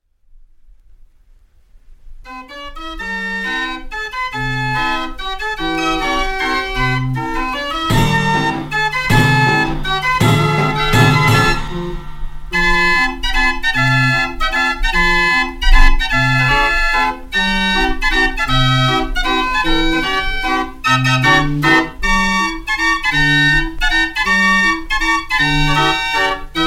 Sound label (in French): limonaire